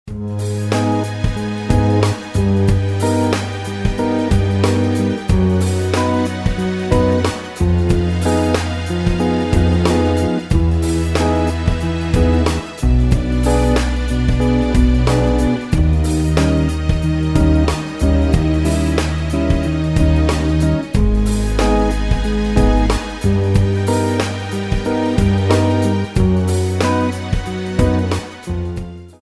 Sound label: smooth jazz jam